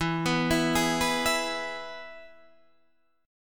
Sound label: E-Major E, Guitar Half Step Down